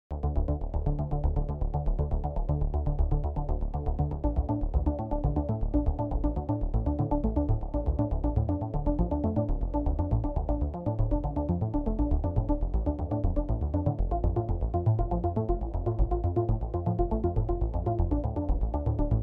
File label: jup8000; arturia; v collection 11